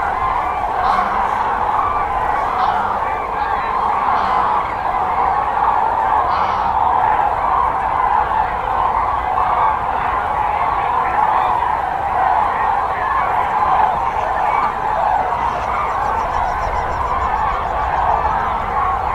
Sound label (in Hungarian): felrepülő récék, tőkés réce; Hortobágyi Nemzeti Park; hortobágyi csatorna